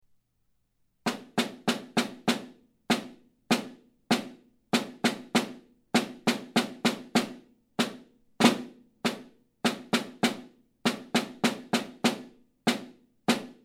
Snare Drum

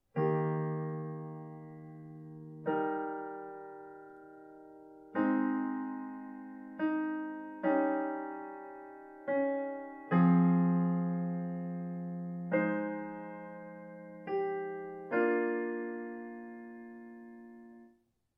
Chord pads as quiet underscore

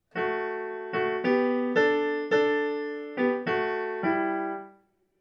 Rock

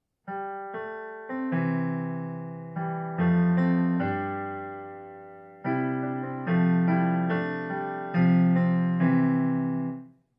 Pentatonic Melody with open chording

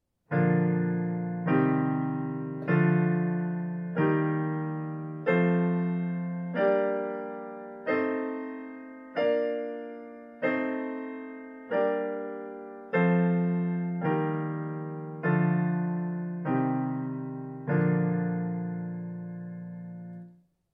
Scales in triads

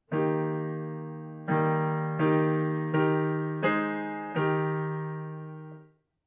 Altering beat patterns

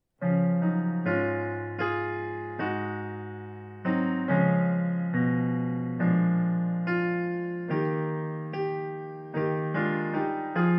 Modulate up by Whole Step

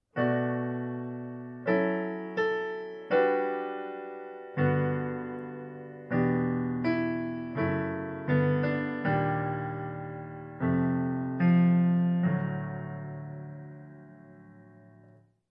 Chord substitutions

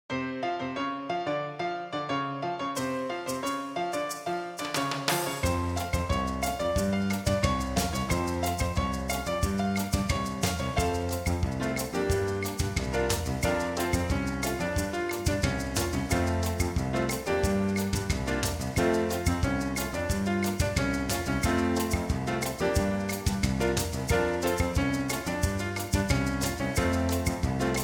Singing Company accomp

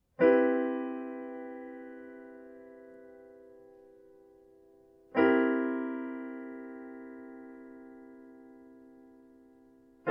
Colors of 7th chords

